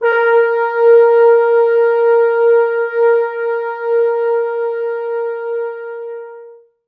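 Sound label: 4 French Horns soft mutes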